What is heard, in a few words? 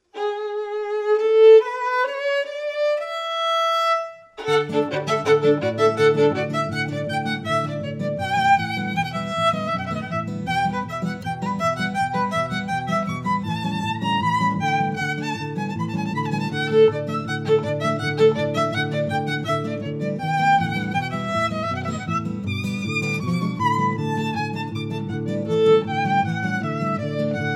casino czardas